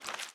slime walk; Minecraft; Remastered

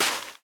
sand walk, step; blocks; Minecraft; Remastered

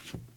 squeaky wood wander, step, bamboo; blocks

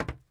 metal box walk; blocks; step

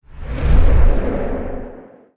growl, enderdragon

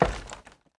ladder, step; blocks

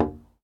glass hit

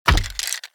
hurt, skeleton

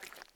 mud wander, step

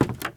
squeaky wood walk, step, bamboo; blocks

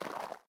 mud walk, step

